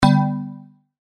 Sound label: bell, slot